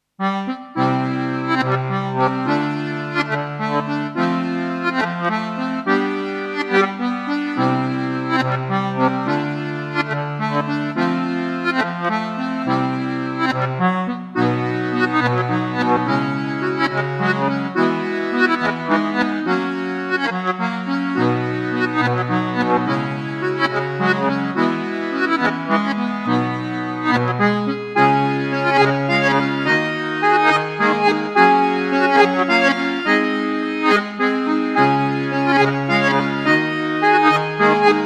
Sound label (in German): akkordeon